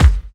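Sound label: snare drum bass drum, Dance Mega Drums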